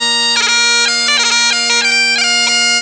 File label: bagp toot, Musical Instruments europe